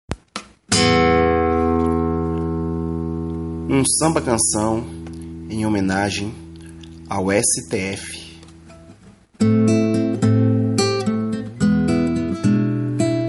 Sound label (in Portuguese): Samba canção